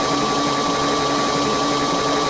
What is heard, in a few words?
max, vehicles, caddy; tdmcars; gtav